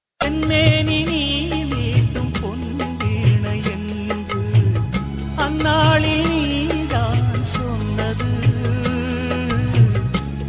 sad ringtone

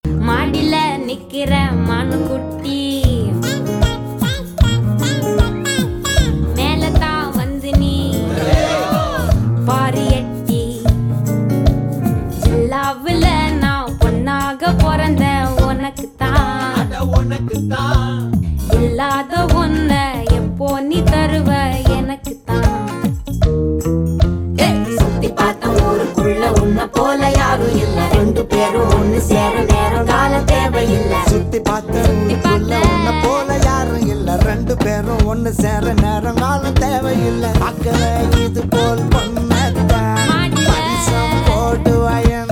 female; tamil; vada chennai

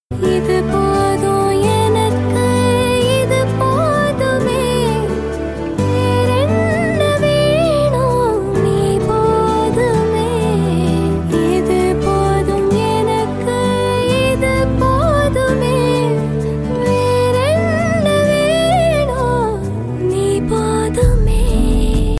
female

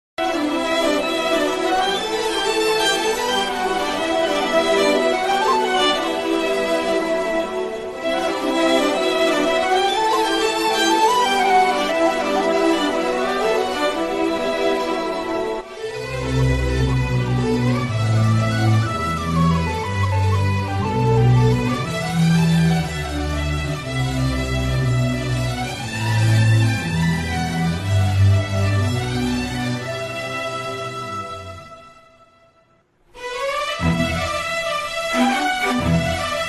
love bgm ringtone; vijay thalaiva

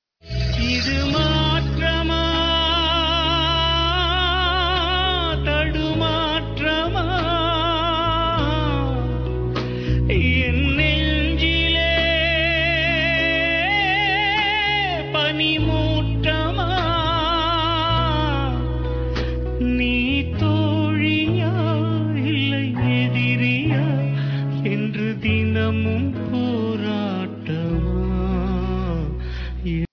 ringtone

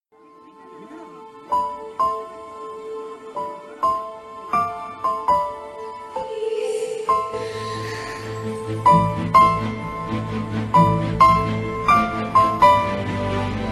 love failure bgm

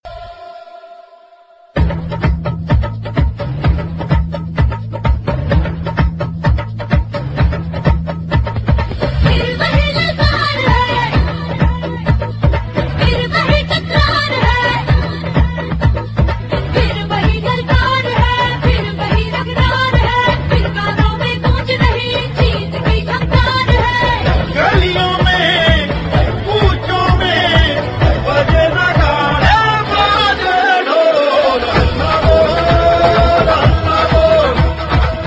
song ringtone

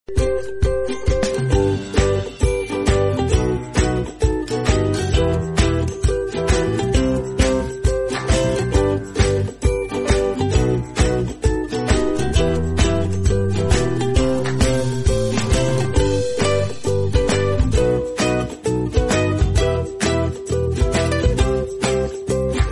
background music

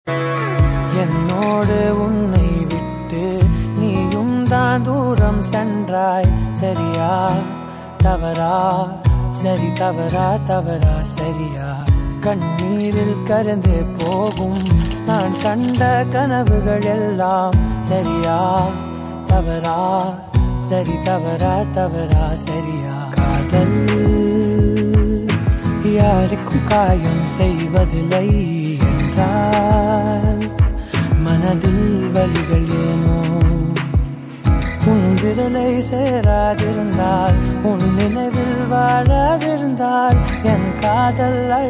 sad love tamil ringtone

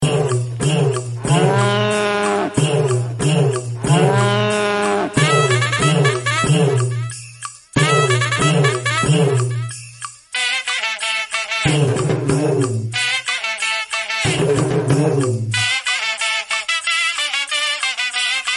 instrumental tone; kadaikutty singam

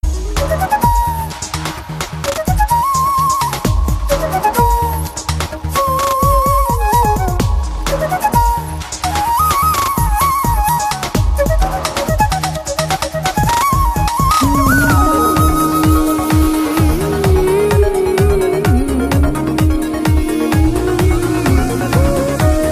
bgm ringtone; tamil; ispade rajavum idhaya raniyum

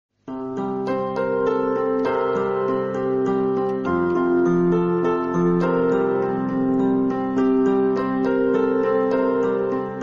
love bgm background music

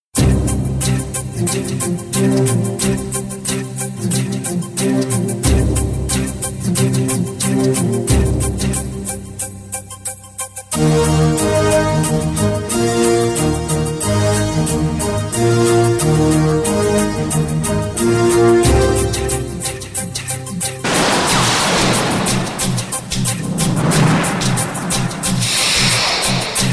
bgm; tamil; indian 2

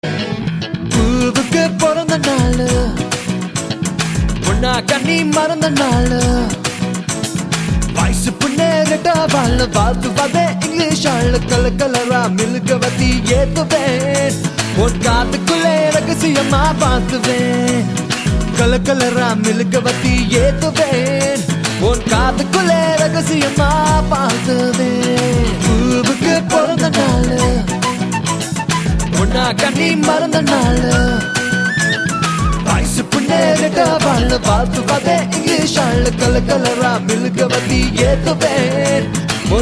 birthday ringtone

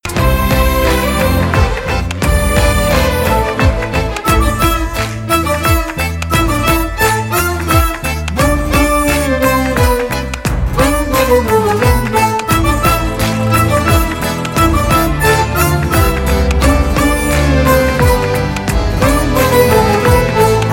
bgm; tamil; vikram vedha

